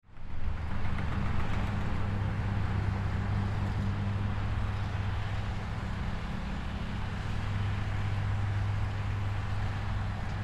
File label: Street Light Traffic; Traffic and Cars; Ambience